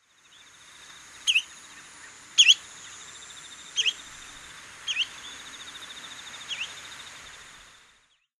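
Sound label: spotted redshank, hyogo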